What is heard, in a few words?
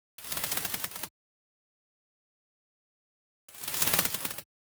In Flight Birds